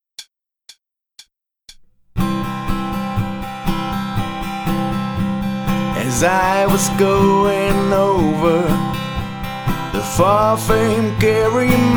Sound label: Whiskey In The Jar, gitarre; Garantiert Gitarre Lernen